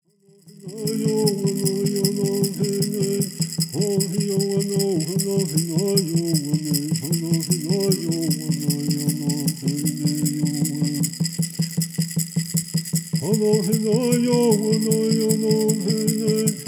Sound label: Peyote Song